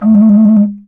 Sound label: moc li, MOCENO BASSFLUTE LICKS; PS-52 WORLD INSTR 2; WOODWIND AND BRASS